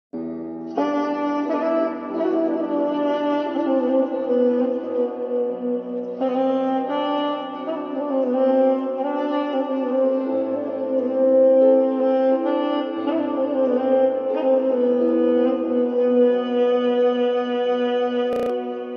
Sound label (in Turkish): saksofon